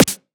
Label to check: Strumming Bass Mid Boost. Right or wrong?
Wrong